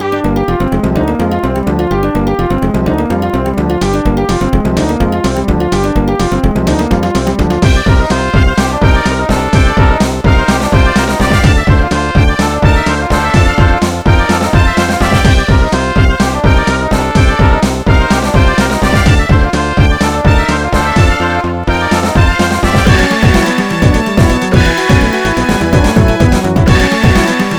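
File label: BGM Desert Battle; Irodzuki Tingle no Koi no Balloon Trip 2009 NDS gamerip; BGM Sabaku Battle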